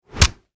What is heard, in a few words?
Impact Punch Body Hard; Punches and Hits; Combat